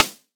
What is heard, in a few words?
Drum Rim